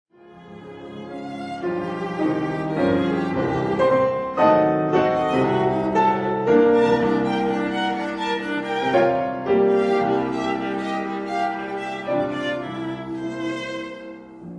Sonata for Viola and Piano in F minor Op 120 No 1 I Allegro appassionato; Brahms